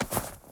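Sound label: item generic up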